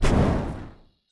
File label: phantom phoenix shot